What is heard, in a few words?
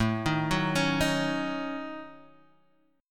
Eb Minor 7th Flat 5th A